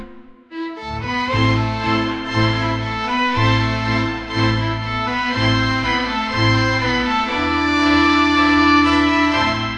string quartet, bella ciao; musiclm; melody conditioning

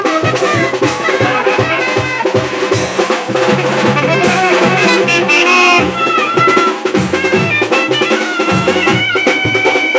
street performance; musiclm

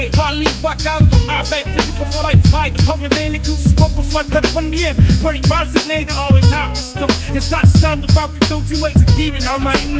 east coast hip hop; musiclm

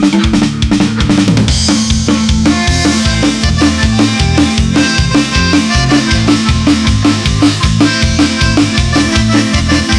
accordion death metal; musiclm

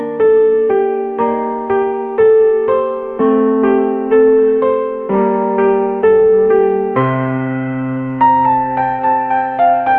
beginner piano player; musiclm

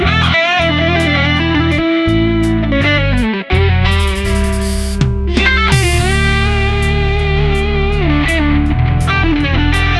electric guitar; musiclm

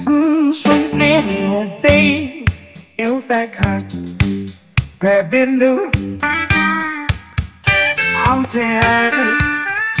blues; musiclm

